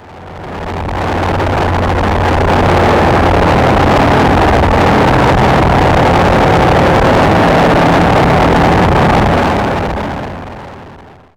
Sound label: Scary, nightmares; Dream Zone